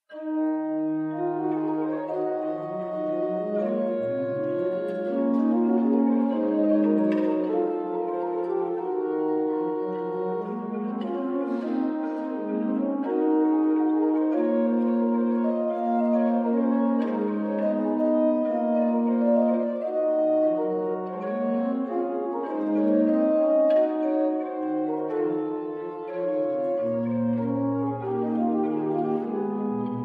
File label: Gothic organ